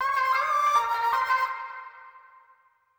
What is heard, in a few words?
ethno, 160, C